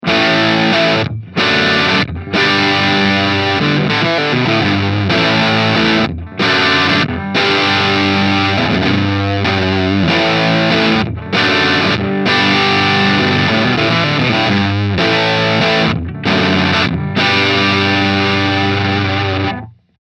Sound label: Distortion; GarageBand